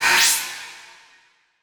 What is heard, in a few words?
Transition Whoosh Fire; Production Element; Title Transition; AdobeCC